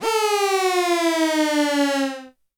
SYN, SYNTH FX

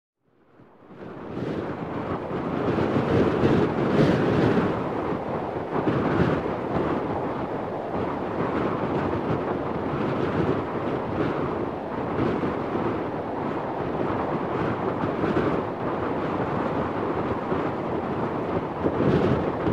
Heavy Wind Coastal

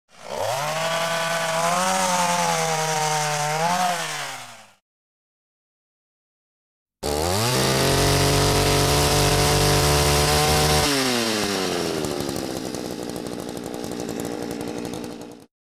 Chainsaw; 100 sound effects

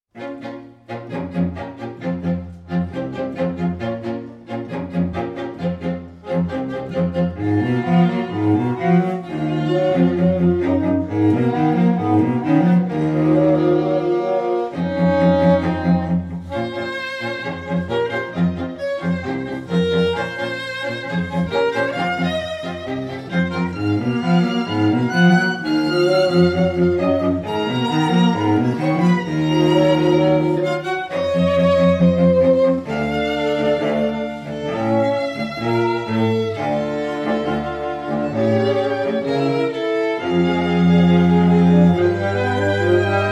piano and string quartet; Fabrice Ravel-Chapuis

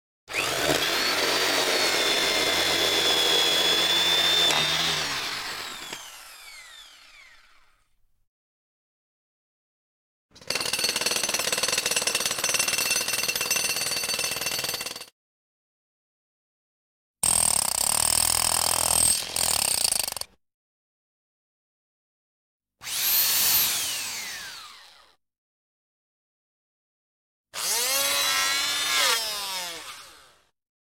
Drills; 100 sound effects

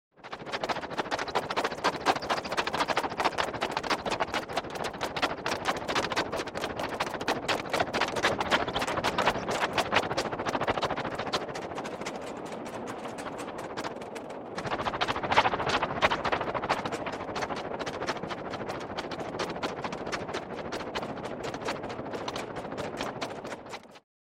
Synth Generated Helicopter Blade Malfunction; Electronic Sci-fi

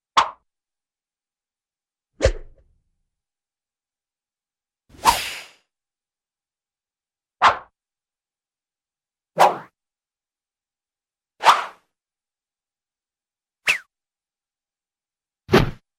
Various Swishes; Hollywood Edge Cartoon Trax

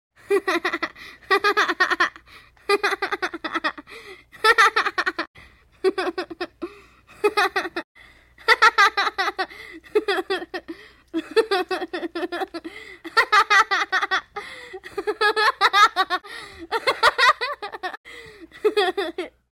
Giggling Boy Close Perspective; Human Sounds

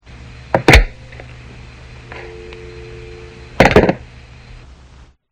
Telephone Pickup And Hang Up; SOUND EFFECTS1

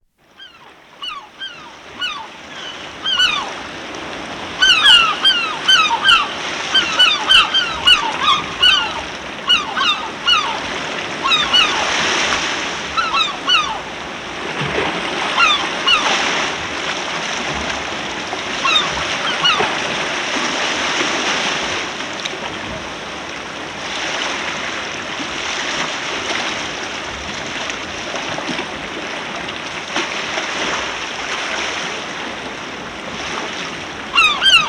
Rocky Seacoast, Nature Sounds; Dr. Sound Effects; 210 Nature Sounds - 20 Hours of Relaxing Natural Ambiences for Meditation and Sleep

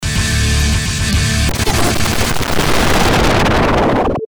Tunie Stab, Stabs & Bursts; Best Of N2O Library

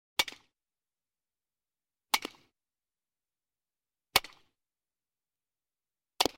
Aluminum Bat Impacts Without Decay; Sports

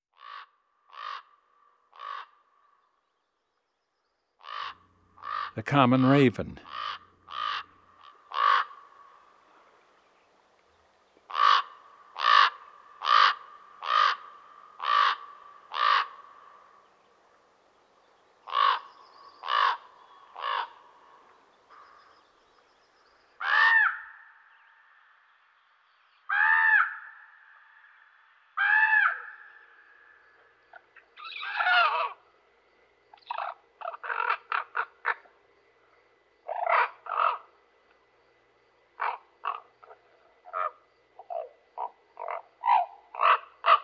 Common Raven; Eastern North America; Dan Gibson; Wildlife Identification By Sound

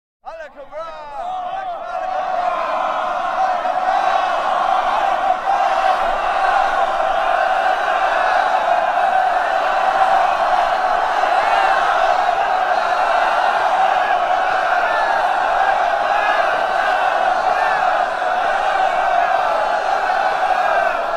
Infantry Roars Alakabra Men; Historical; Hollywood Edge Historical Series; War Horses Armies & Weapons

